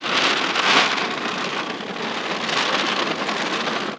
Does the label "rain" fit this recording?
Yes